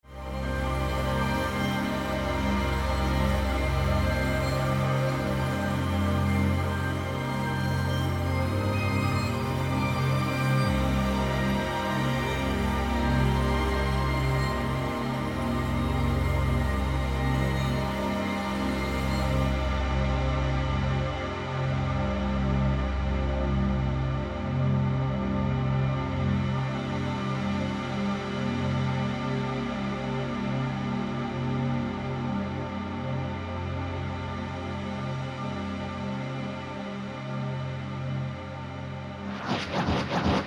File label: System Check Vibonacci